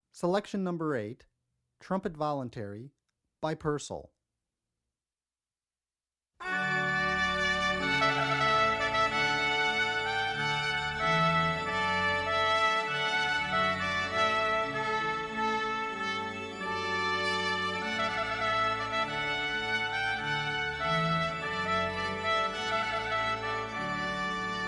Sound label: Trumpet Voluntary Purcell; philipfeo